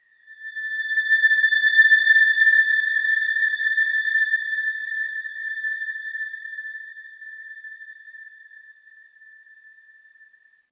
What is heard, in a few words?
Oneshot Synth; Zombie Army 4 Dead War